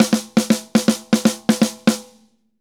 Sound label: Drums Poly 120, Drums & Percussion